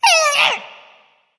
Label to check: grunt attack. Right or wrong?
Right